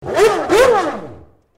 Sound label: Nokia Car email